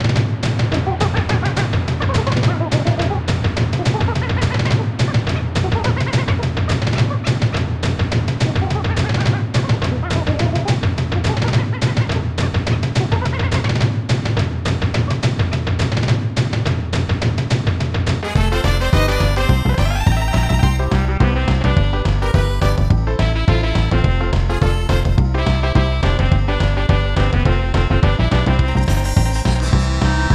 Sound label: snes beat; donkey kong country 3